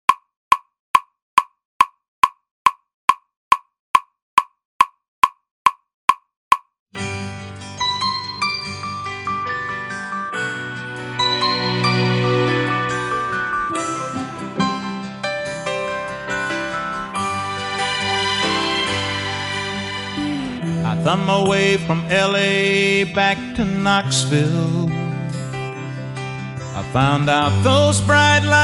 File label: Drumless